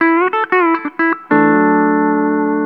COUNTRY

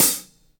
XV Hat C, Roland XV-5080

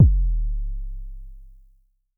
K 808 ish, Vermona Mono15 Drums Kicks

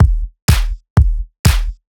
Strip 124bpm, House Drumloops; Zero-G House Fabrik; 90 s Sample CDs

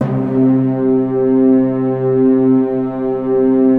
PAD, DIGI-PADS

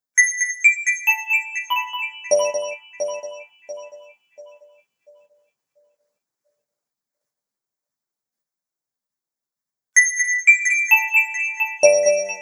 Twinkle Bells, Electro Lounge; Sonic Foundry (Sony Creative Software); 098 Savvy

